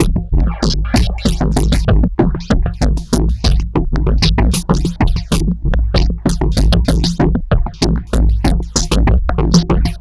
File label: Drums Strange